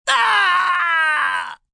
Pain Anguish, Pain, male, short cry of anguish, agony, moan; human voice